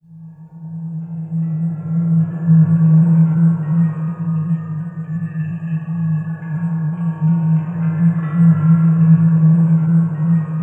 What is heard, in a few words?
ATMOPAD; Best Service; XX Large; Pads